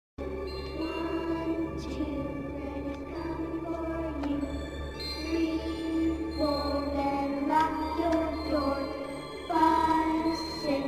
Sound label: Lullaby